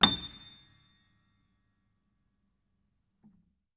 Note High, Piano; Piano Miscellaneous

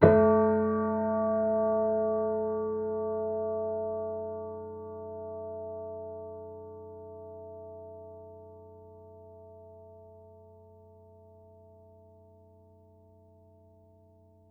Piano Harmonics, Harm Low Mid

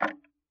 Tick, Clocks Kitchen Clock

